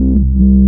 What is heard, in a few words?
combat scan loop, scanner; scmod